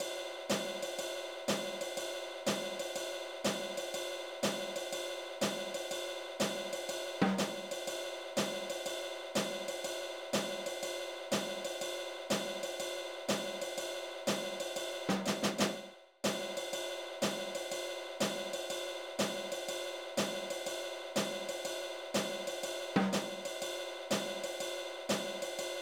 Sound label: Creche Music Loop; Baby Life; nds